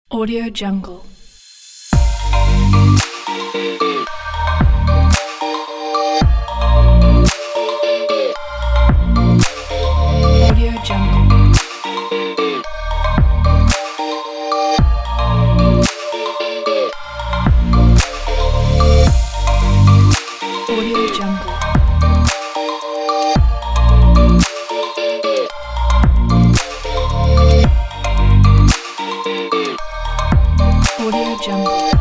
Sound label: beauty slow motion